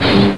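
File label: crowbar hit body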